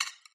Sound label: xen infest click; infestation; Half Life Alyx